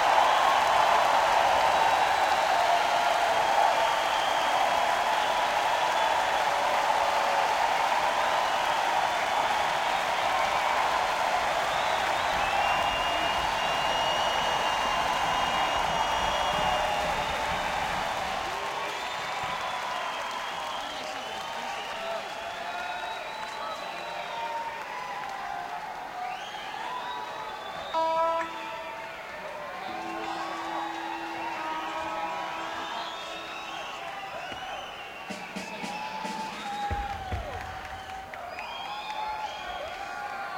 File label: Encore Break; sony ecm270